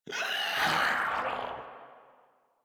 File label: Infested Runner Explosion Warning; Warframe; Runners